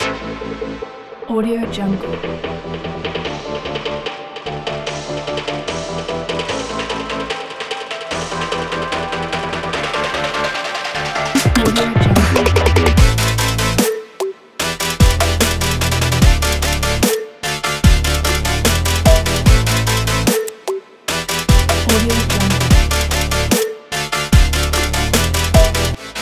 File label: future bass